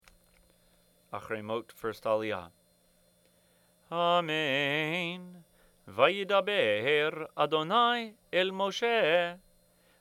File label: Torah Chanted; Akharei Mot